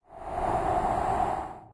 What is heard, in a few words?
wind; smite; kukulkan